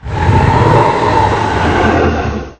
growl; Necromancy

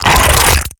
Exertion Light; Evolve; Gorgon

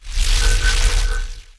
Grounder Glide; Evolve; Kraken

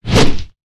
Melee Whoosh; Evolve; Goliath

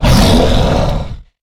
Pain Light; Evolve; Goliath

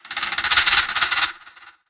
Lurker Sniff; Evolve; Gorgon

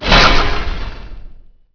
ice strike

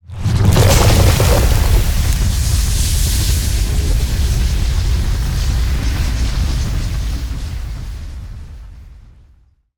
Mortar Cannon Flame Puddle; Evolve; Behemoth

